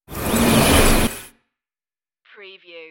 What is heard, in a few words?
starship sliding door